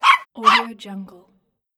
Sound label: small dog barking